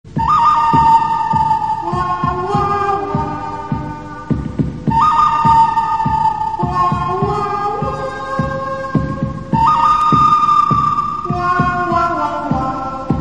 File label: cowboy, ringtones